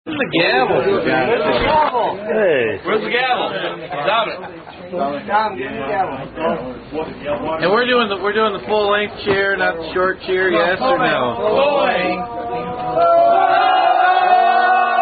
Cheer, Fraternity Chant; ATO Cheer

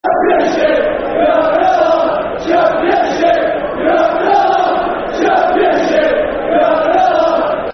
Football Chant; Championship, Yer Having A Laugh; Crewe Alexandra Cafc

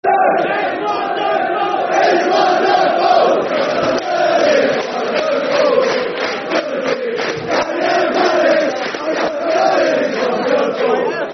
Oh South London, Football Chants; Crystal Palace Cpfc

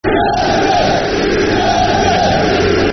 Football Chant (Soccer Songs); United; Hartlepool United Hufc

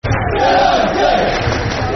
(Clap) Football Chant (Soccer Songs); United; Colchester United Cufc

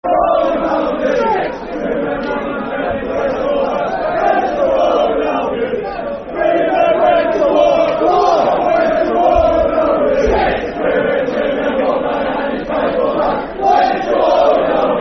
Went To War, Football Chants (Soccer Song); Wolves; wolverhampton wanderers; fanchants

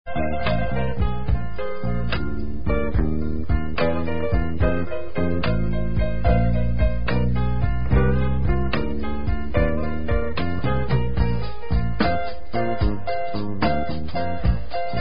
Instrumental

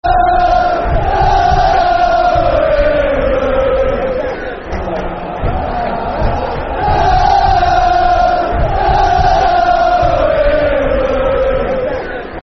La, La, La Lalalaaaa, Football Chants; La, La, La Lalalaaaa....birmingham; Birmingham City Bcfc

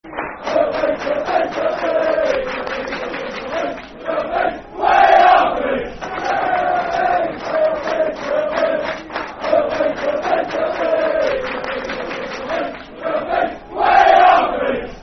We Are Bees football chant (soccer song); Brentford

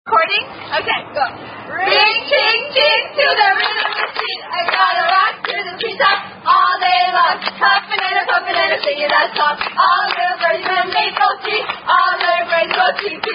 Ring Ching Ching, Ring Ching China

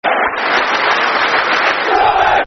Derby, Football Chants; Derby County Dcfc

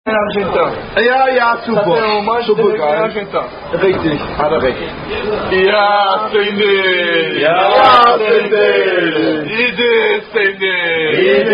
Unknown Portugese Drinking Toast Game Tradition; Trinkspiel In Rio

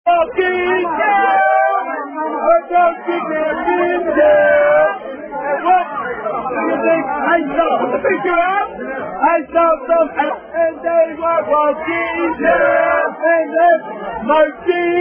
Omegas Walking Chant, Fraternity Chant

